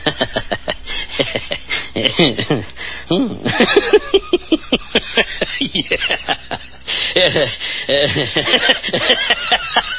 funny laughing

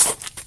planting sound; plants vs zombies

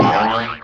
boing censor; digital circus

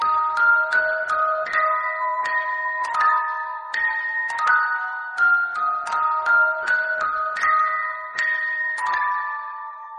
soundtrack; dead silence

